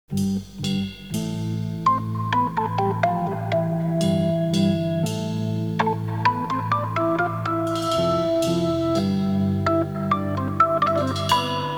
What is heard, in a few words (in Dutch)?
mineur of majeur